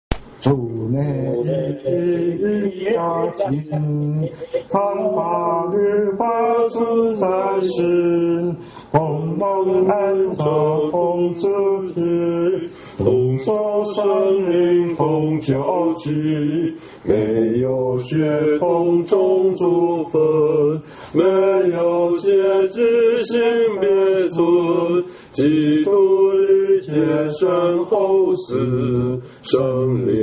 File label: hymn; worship